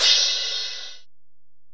Ride, Alesis DM-PRO, Drum Machines

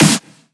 Snares; Vengeance - Essential Clubsounds Vol.4

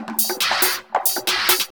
percussion loops, 138, Techno

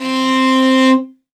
L, morin khuur, World Instruments

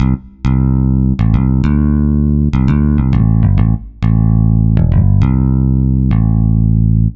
Picky Bass; Dr. Fink's Funk Factory

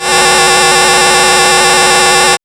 Moog D 3 -L; AMG - Vince Clarke Lucky Bastard

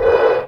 sfx sci fi; Masterbits - Switch FX

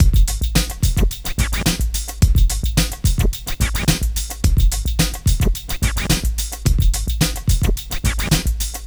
NRG Break, Trance, Drums, Breaks

